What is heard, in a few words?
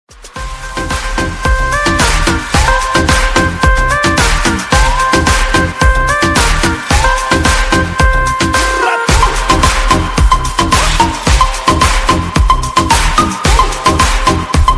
Ringtone